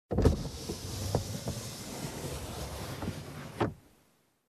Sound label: Manual car window downlift